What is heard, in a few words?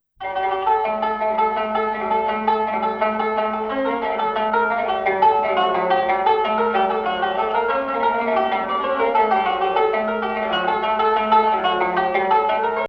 Hijaz Octave